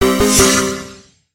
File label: Retro